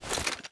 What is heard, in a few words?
Assault Rifle Unequip